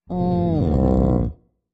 待机声; 嗅探兽

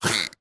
海豚攻击声

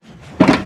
潜影盒关闭声

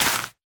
甜浆果丛破坏声

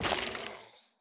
幽匿破坏声

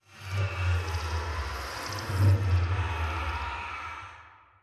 重生锚环境声; ambient

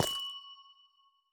紫水晶踩踏声

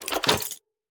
宝库插入声

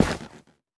菌岩踩踏声

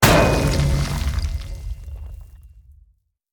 重锤重型地面重击声

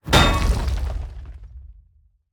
重锤地面重击声

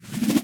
旋风人蓄力声

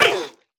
犰狳受伤声

